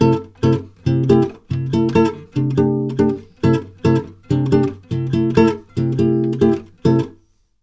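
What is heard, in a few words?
140 guitar F7, brazil bossa; USB Soundscan; AKAI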